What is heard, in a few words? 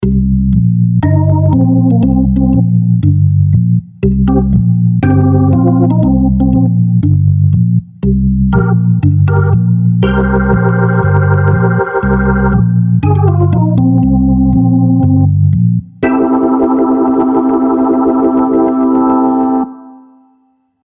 bebop, Leslie; Logic; IK